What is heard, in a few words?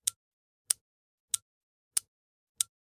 Clock fast ticking